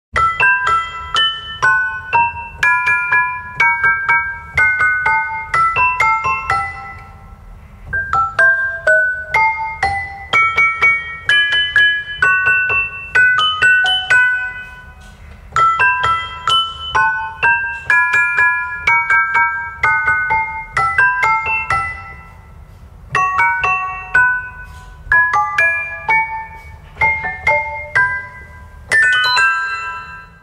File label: Celesta